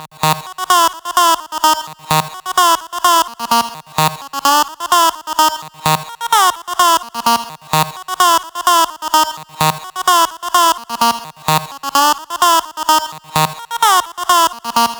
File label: 128BPM Lead Loops Sidechain - D#; MELBOURNE DROP VOL. 4